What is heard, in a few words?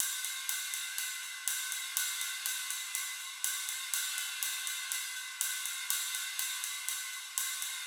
Rides 122 Wet